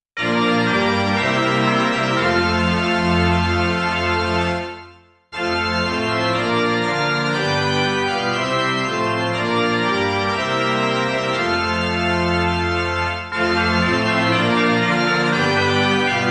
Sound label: midi